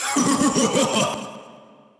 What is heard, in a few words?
huahahah; osu